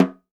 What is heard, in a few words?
Snare Drum; Percussion Samples